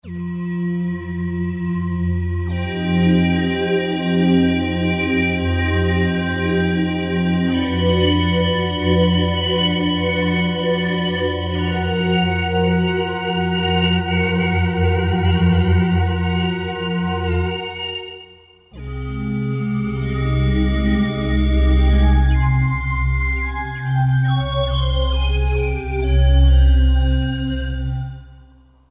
Roland JD-990; WinterVox; Factory Patches examples

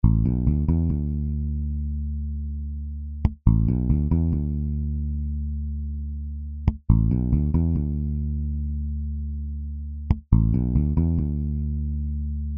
Classic Rock Fretless; Apple GarageBand 2; Apple Loops

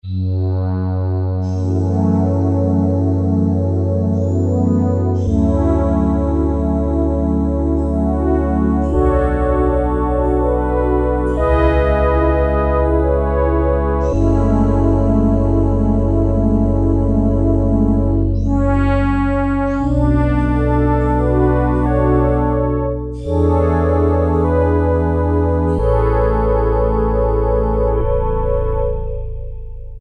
Sample Brass, Roland MKS-70

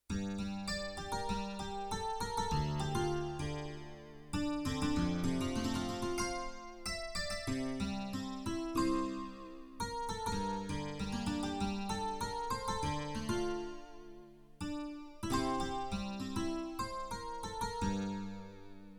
Romantic Harpsichord, Yamaha TX16W

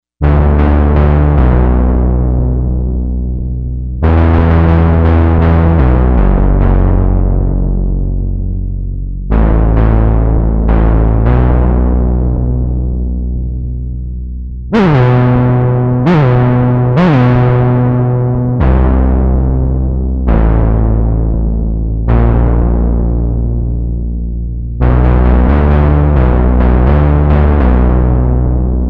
TYMPANI

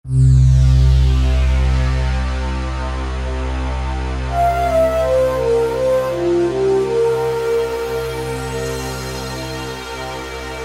Pad; Zzzz Pad; Sonic Reality Modern Songwriter

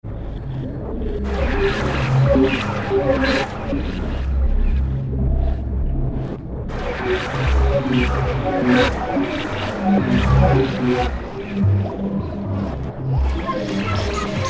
Fishery, Alesis Z2 EuroDance

